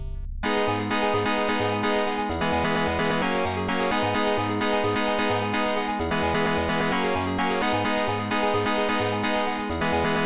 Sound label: piano